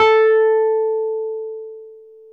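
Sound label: Wurlitzer electric piano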